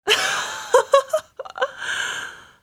haha; nwnds audio

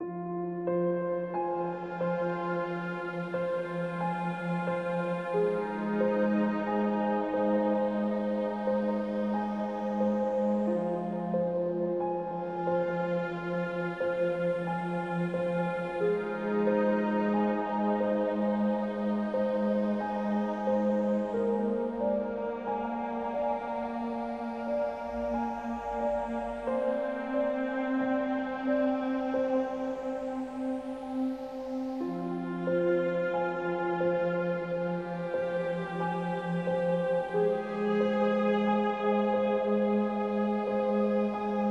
Ambient Sadness; NWNDS; Ambient music pack

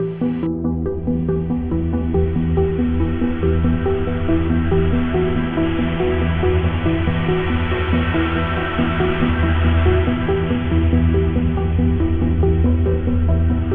Ambient Recollect; NWNDS; Ambient music pack

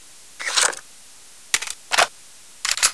firearm reload

